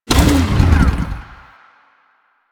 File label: Dismount, Motorcycle; Motorcycle Ninety Nine